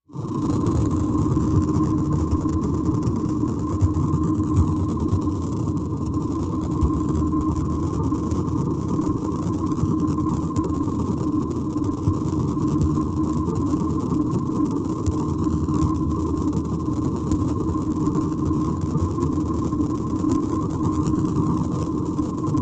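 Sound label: Static Precipitator Machine, sci-fi ambience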